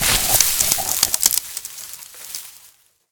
hedge wild break

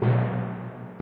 Tymp

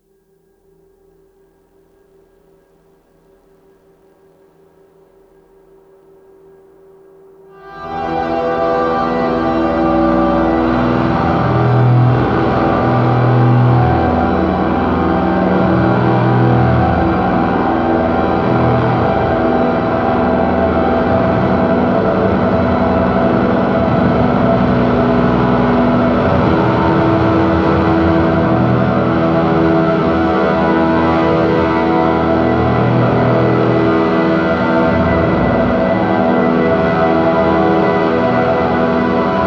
Shortwave Noisetest

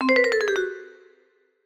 Jingles; catchee

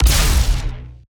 Lasrgun Ballista Fire Long

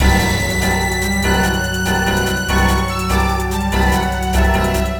BGM STAGE BATTLE; Phantasy Star Zero

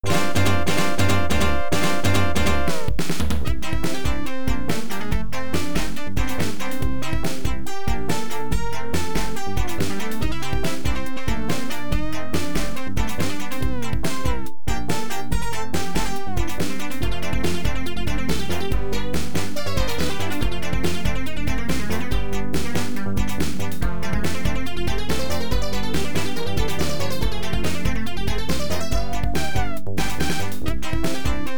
Jackpot Collected, pinball; Mousin' Around; Bally

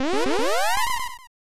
Beam

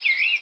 bird random; Level4 Hidden World

